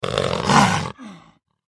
attack voice up; valdagor

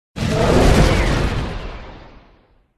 golem buff; pet; green crystallem

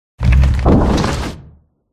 tree attack voice bottom

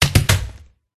attack contact middle

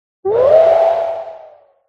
snow wolf pet buff

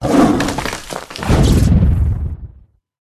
tiger

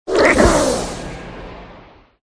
cat death

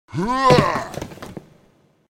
snow man buff

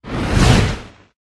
Fire Spirit A mid

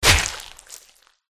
boss attack contact bottom; zigred

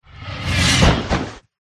hydra attack voice bottom

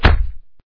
wound punch

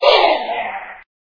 scorpion death

